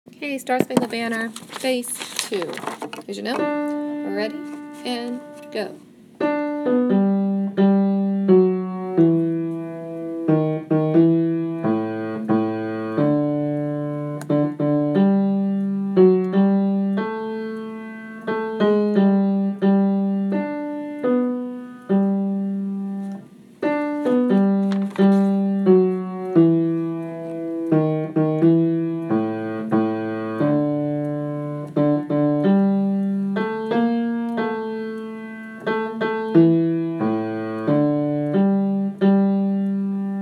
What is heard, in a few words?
star-spangled banner bass